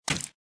splat

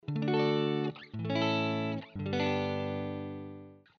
guitar

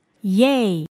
yay

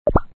plop

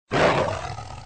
tiger roar sounds effect short

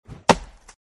axe swing

